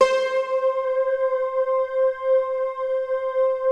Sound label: NYLO C5; Music Store - Claudius Diemeter Schwerlos